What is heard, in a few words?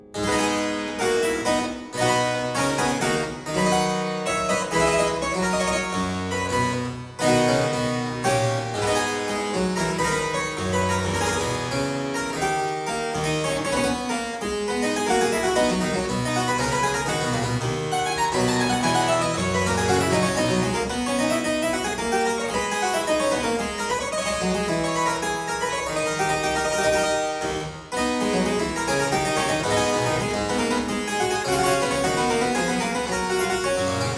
Aria in A minor Variatio 2, Harpsichord; Buxtehude; Complete Harpsichord Music